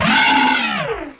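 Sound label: scream; The Elder Scrolls III - Morrowind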